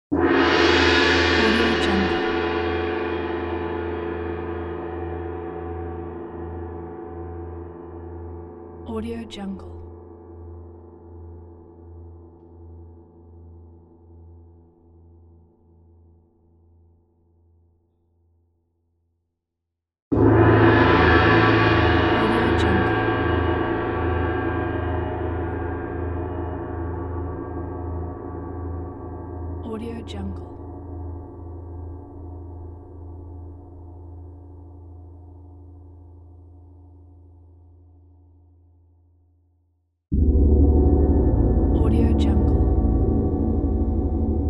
asian gong

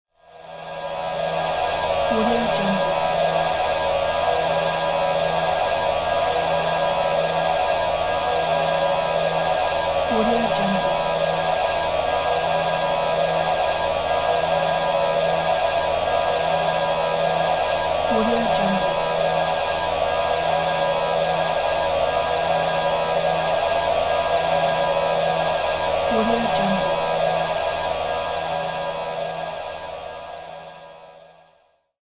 wave movement drone

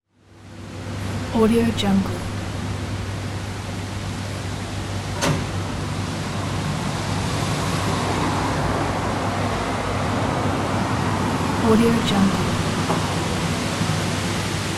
city ambience and traffic

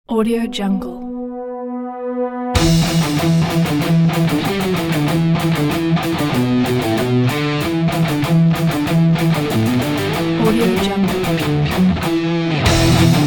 heavy celtic knight

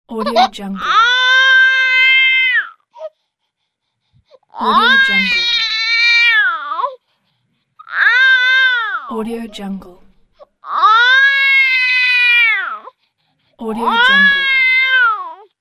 baby crying

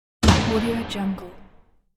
switch sound

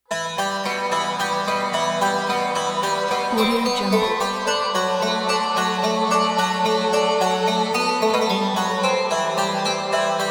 turkish cinematic; turkaynisanci

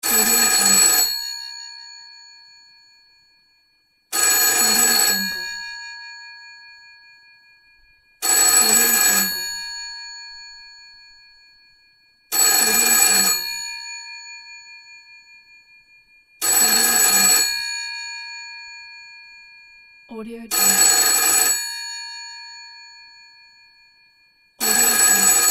phone ringing

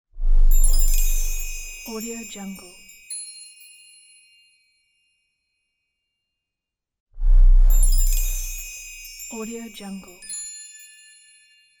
magic; volkovsound

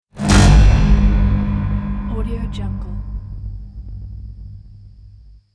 boom hit trailer

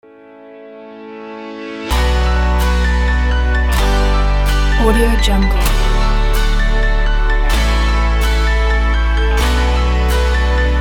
just positive tropical pop

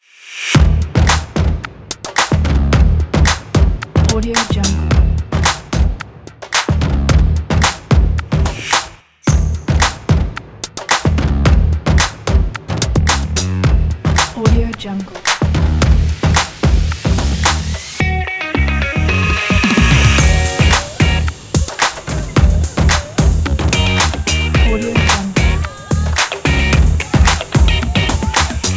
promo funk

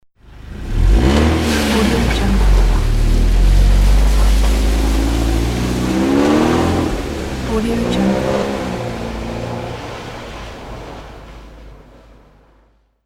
car starts and leaves in the snow; soundfood

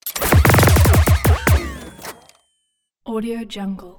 steampunk weapons shotgun burst; soundmorph

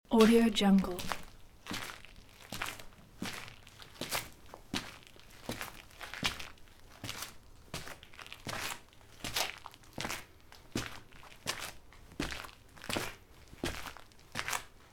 footsteps on dirty concrete loop

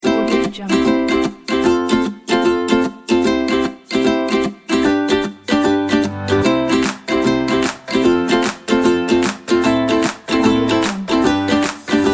happy children

